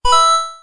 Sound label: flip click